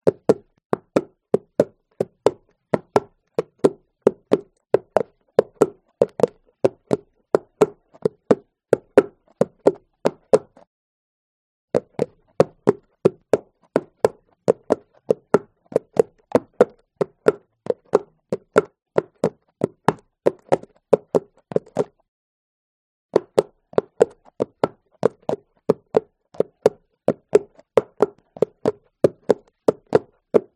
HORSE Hooves Clack Walk Asphalt; ANIMAL; smartsound

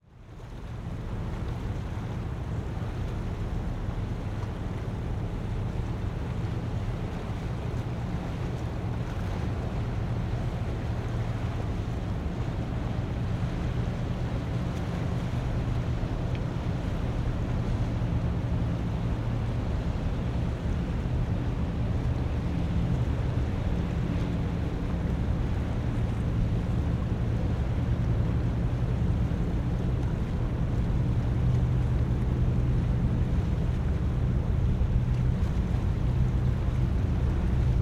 transport boat ship large vessel pass by harbour

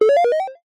multimedia game sound classic 1 up

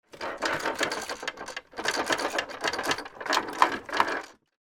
toy plastic doll house movement rattle

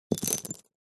industrial bolts metal few small set down carpet

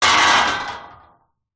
metal frame bed loose hit rattle; impacts

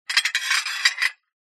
household plate broken pieces large movement

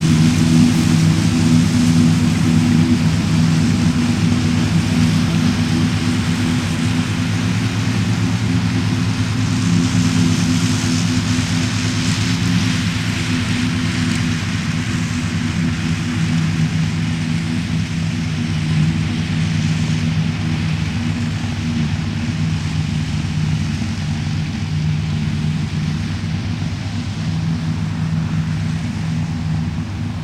industrial tractor operating close; martinimeniscus

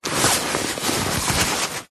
luggage bag rucksack handling movement; zapsplat

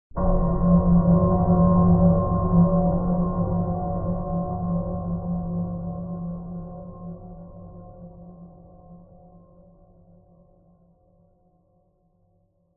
cinematic hit dark heavy metallic scary; sound design

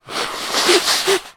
human blow nose into tissue

